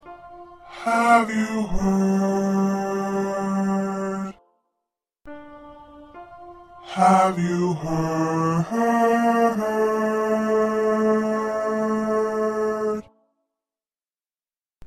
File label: 2nd Bass